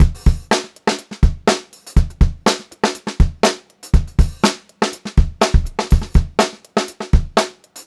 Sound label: Funk-Groove Drumloops, 122 FUNKY, R; USB Soundscan vol.38; AKAI; 90 s Sample CDs